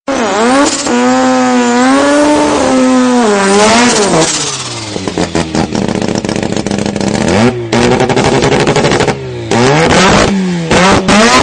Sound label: old telephone